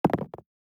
DICE on Neoprene, Throw and Roll, Standard, 2 Two Dice; Samples; ArticulatedSounds; DICE Sfx Party (Extended)